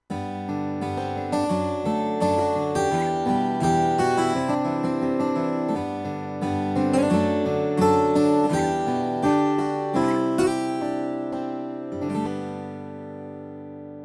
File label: yamaha tyros 5 voices 12 string guitar